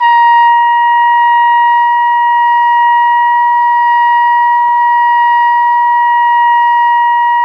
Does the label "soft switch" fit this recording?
No